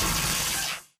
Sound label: bullet energy titan arc; Titanfall 2